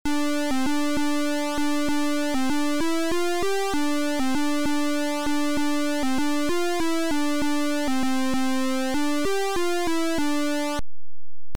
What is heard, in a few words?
Title, Beeper; Fire Lord